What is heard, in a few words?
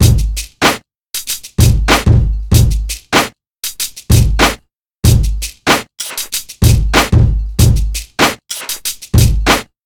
95 bpm breakbeat A key; drum loop